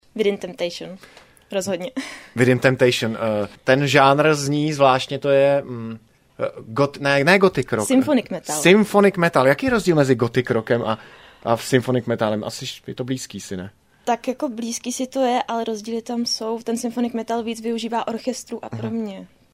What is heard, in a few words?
SYMPHONIC METAL; katce kuncove se snoubi symfonicka krasa metalovou protrelosti